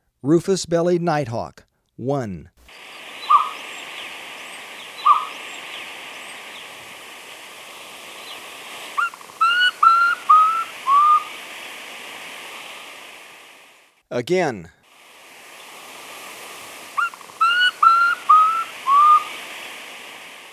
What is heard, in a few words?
Rufous-Bellied Nighthawk, Birds of Ecuador; S. America